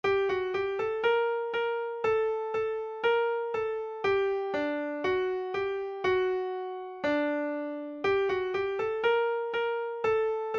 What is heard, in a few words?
carpathian tune harmony